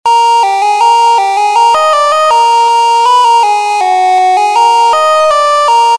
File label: Ringtone